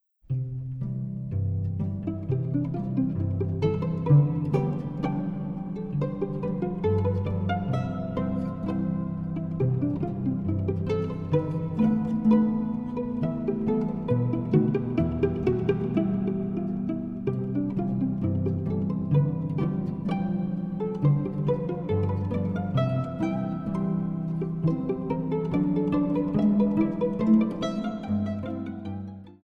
pizzicato polka

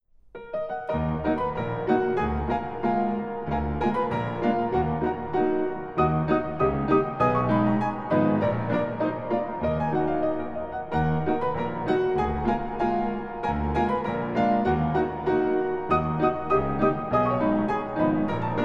polka italienne

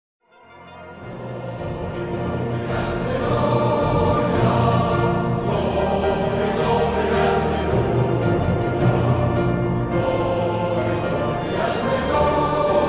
battle hymn

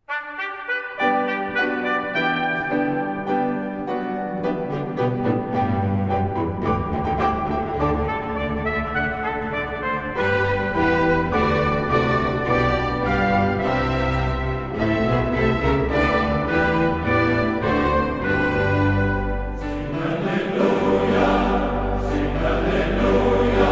amen hallelujah

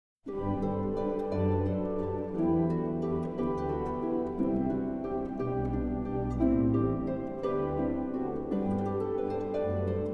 gigue

